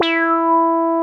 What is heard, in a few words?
JUNO FUNK E5; Drums In Your FACE!